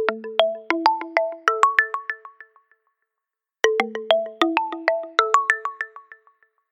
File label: samsung Ringtone; Clarity